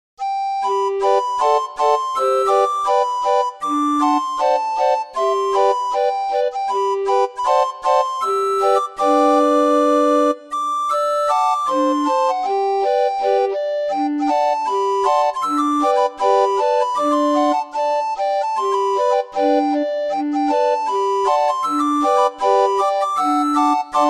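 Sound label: Buffalo Gals, orgue de barbarie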